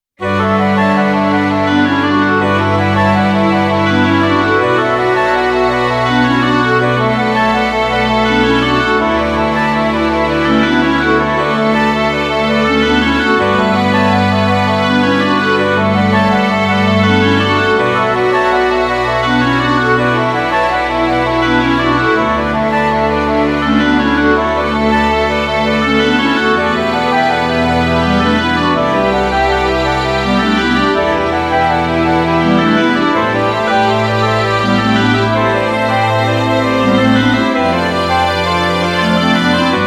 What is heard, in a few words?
Instrumental; PM USA